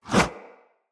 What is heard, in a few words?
Battle Dog Paw Swipe; Snc Battle Dog; Combat